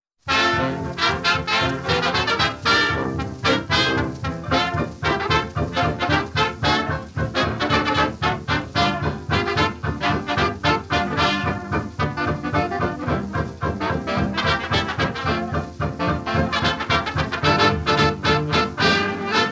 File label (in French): Swing café